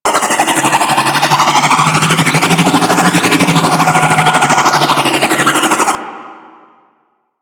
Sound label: Briefcase Scythe Proj Loop; Crp Briefcase Scythe